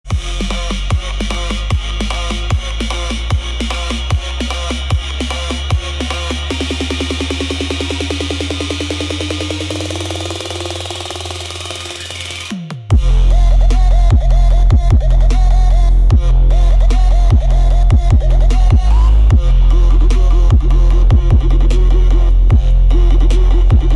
Extreme bass boosted

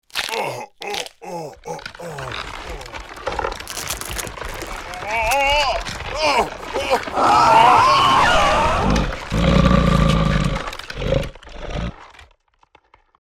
Werewolf transformation male

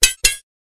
Two swords clash together double strike

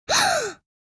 Young girl shock

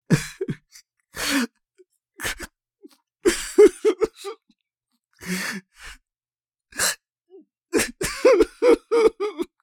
Man sniffling and crying

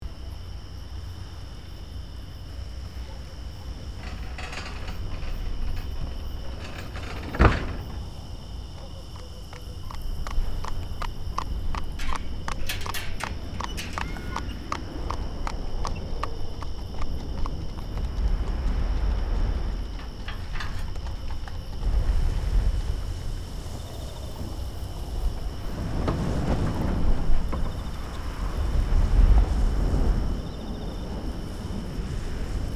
Castle ambience medieval outside perspective

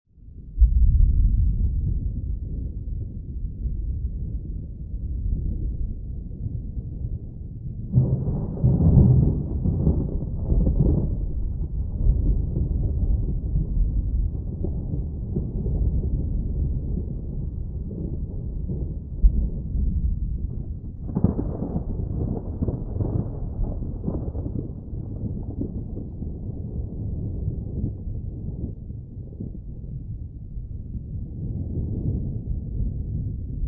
Thunder crackling to rumbling distant perspective

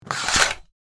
Shower curtain slide rings on metal rail; blinds

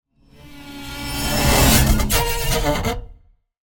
Metal wires dissonant phrase

